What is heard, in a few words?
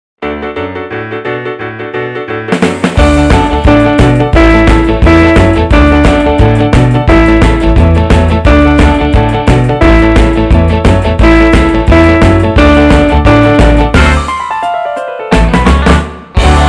Two Note Jive